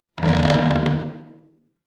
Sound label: door creak